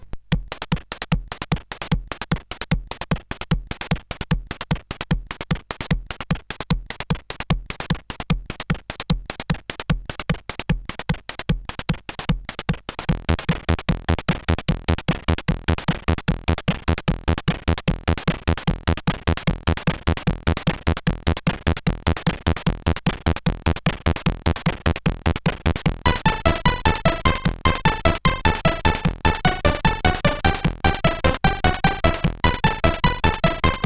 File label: Breakout Remake, sid CSG8580R5